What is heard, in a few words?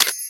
camera click continue; Fujitsu F-08D